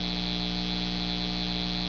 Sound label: lightbulb; cstrike; kz lost factory